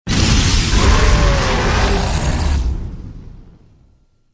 roar; hulk

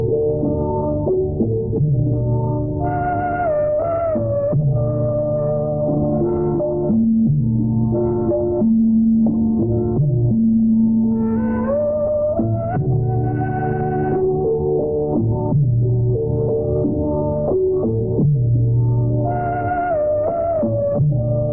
montage bgm; Doordarshan

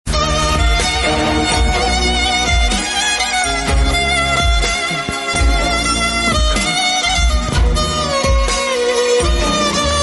violin bgm

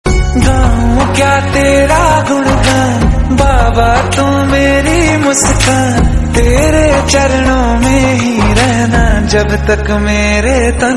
devotional hindi

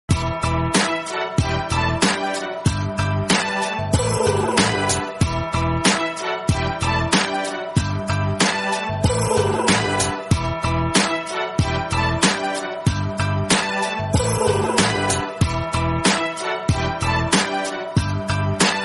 instrumental bgm

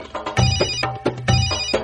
nice arabian rington

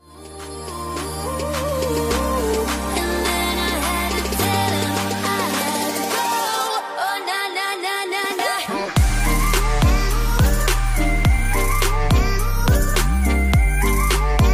marimba mix

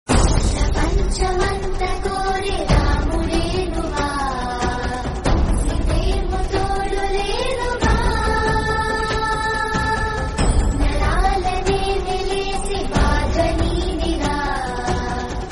romantic telugu; sita ramam

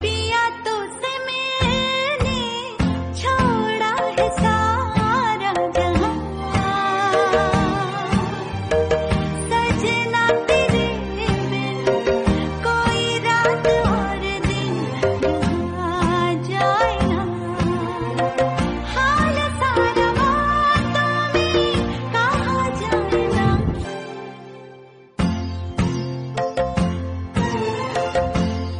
hindi serial song

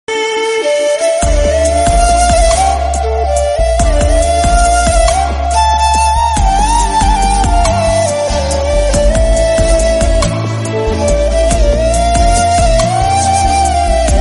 chinese drama bgm

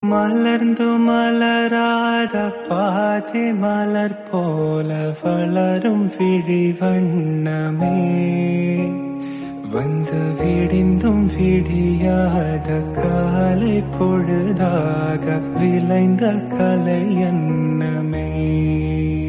cover tamil song

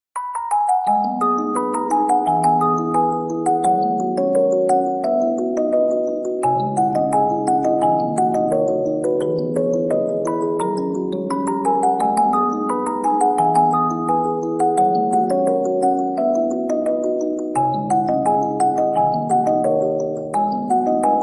music box

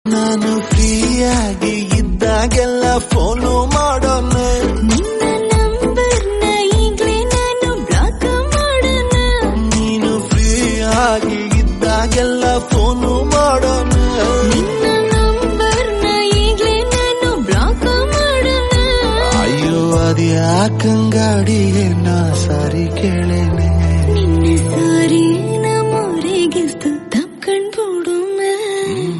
kannada song

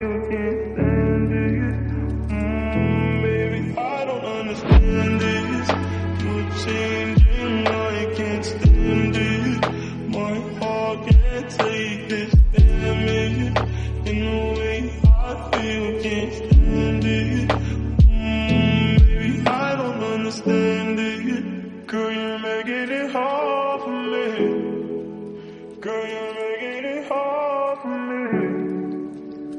lofi flip

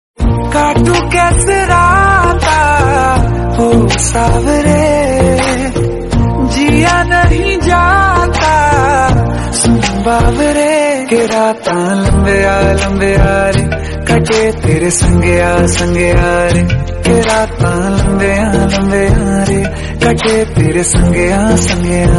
hindi, love

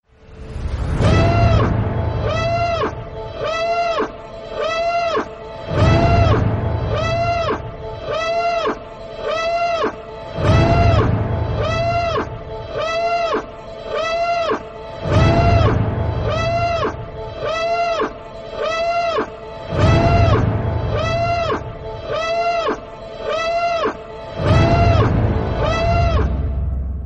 imperial alarm

